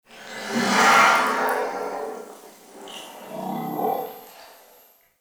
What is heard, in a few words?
Digest Interior Small; Ambience; Warframe; Sentient Lair